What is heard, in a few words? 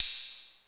Hihat Open Soothed